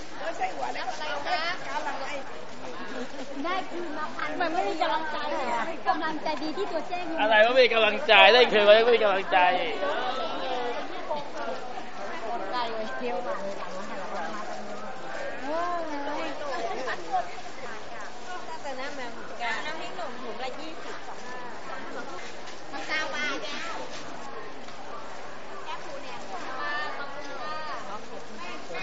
indoor market

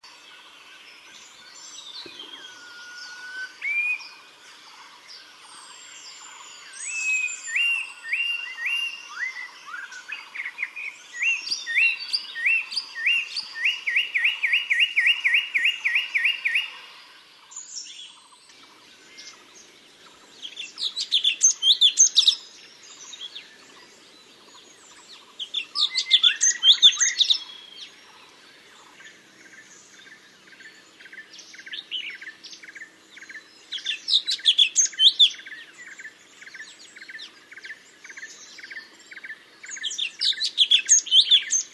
hiland ambiance

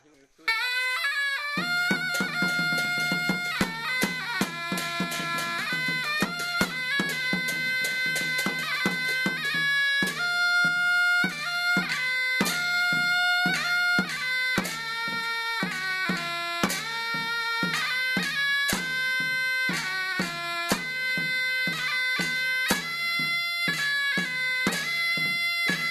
Mien shawm and percussion

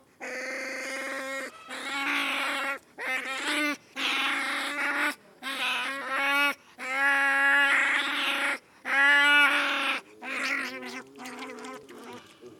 otter

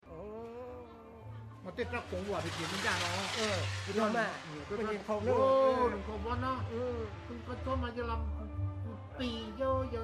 Hmong New Year

